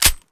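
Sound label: slide release, m1911; svencoop; ins2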